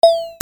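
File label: percussion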